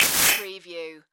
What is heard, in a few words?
Distortion Stab Whoosh Metallic Rattle